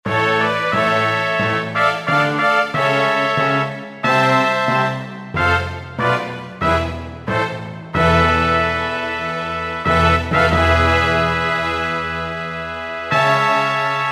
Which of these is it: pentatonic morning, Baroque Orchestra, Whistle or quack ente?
Baroque Orchestra